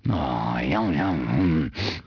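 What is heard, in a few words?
male yum; People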